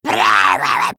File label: Male Murloc Attack